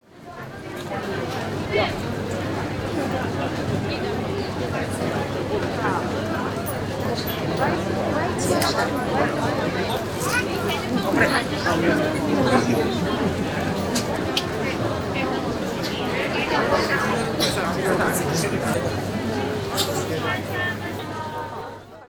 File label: crowd noise